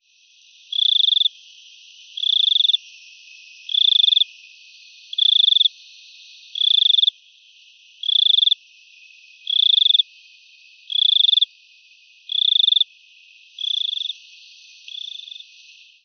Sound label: cricket